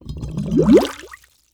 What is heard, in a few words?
Tank large bubble; SFXBible